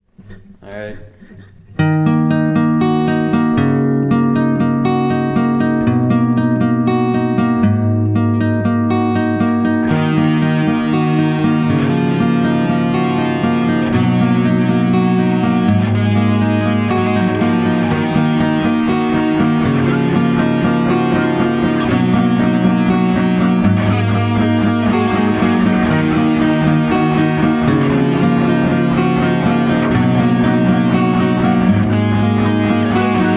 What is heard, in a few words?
guitar and electric